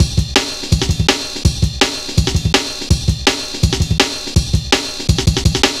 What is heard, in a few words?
165, Drum Loops, Drum & Bass